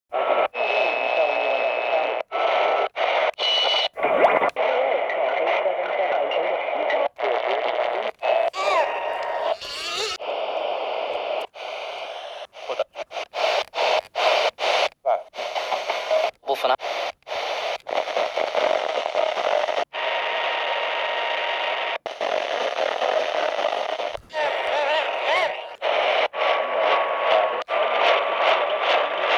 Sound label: Radio Tuning Static Noise Collection; Raw Noise Sound Effects 1